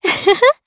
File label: Laugh, jp; kingsraid